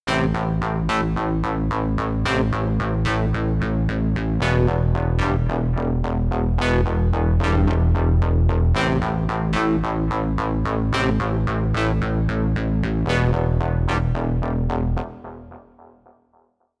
call Spaceship, ringtones; TCL; L10

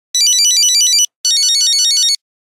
Intro, Nokia Buzzer; Windows Phone apps

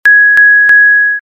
battery low; Samsung SGH-F310 Serenata

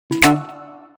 Dong, notifications; Wiko Jerry 2

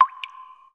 Water Drops, notifications; Infinix; XOS 16

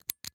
Lock; Nokia; X

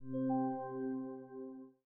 Charging Started; Tecno HiOS 16; ui

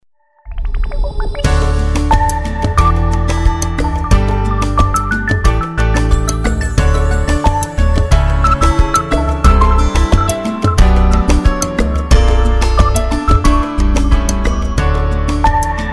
Fly, alarms; Power Rage

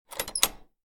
Door Lock; Tecno Spark 40C

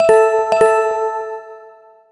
video chat, notification tones; BlackBerry OS-10; bbm video

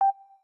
volume key; Rollable LM R910N; ui; LG

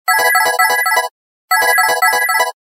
Ringtone, Samsung GT-C3110

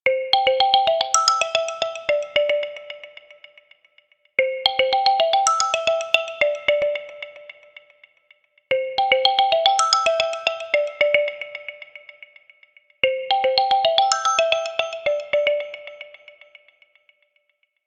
Ticktack, ringtones; Tecno Phantom V Fold

